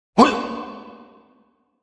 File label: Martial Arts Yell "Whuugh"; Combat Voice Sound Effects